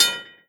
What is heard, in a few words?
train crossing bell; world misc